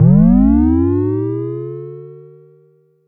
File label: vermona drm1 mk3 fx